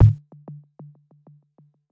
Jingle Time Penalty; super rub a dub; ps3

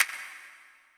Team Skill Clock; disney star smash android ios mobile gamerip 2020

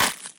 Crack Egg; Lethal Company

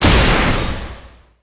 Sp Heart Meteor Fall; Sp Swingpower Hit

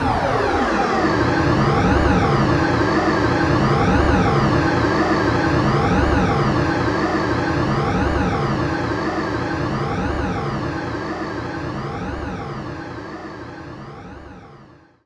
Enemy Masquerade Active Loop

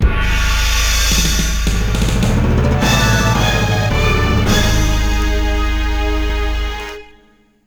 Game Over; metal slug; neo geo cd

